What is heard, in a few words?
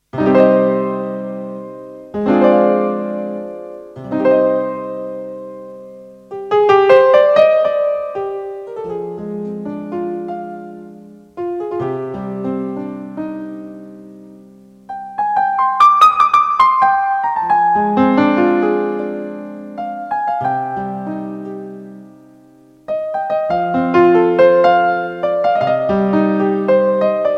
Instrumental